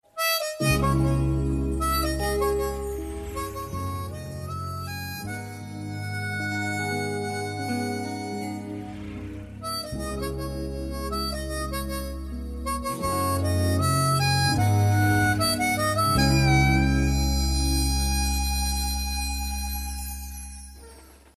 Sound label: bgm